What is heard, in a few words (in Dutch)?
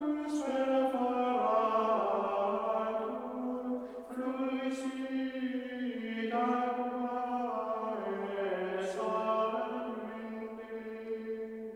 Koor mannen Gregoriaans; Ave verum Corpus